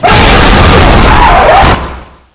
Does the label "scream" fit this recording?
Yes